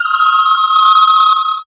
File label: touch screen digital push